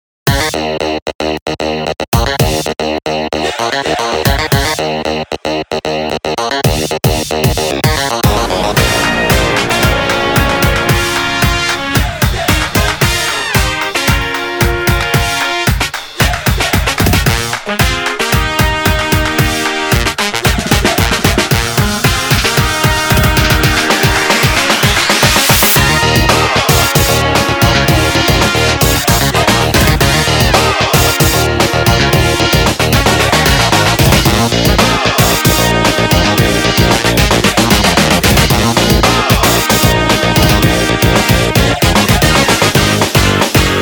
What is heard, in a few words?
Drumline Beat Test